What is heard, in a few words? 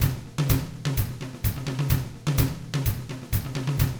127 Drums